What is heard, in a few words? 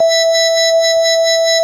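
organ Vox E5, Continental, wah bar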